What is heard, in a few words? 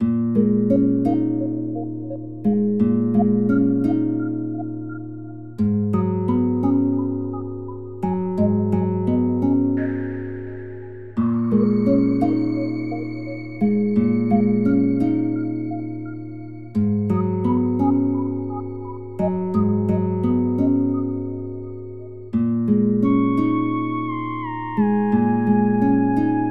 Bgm Field Forest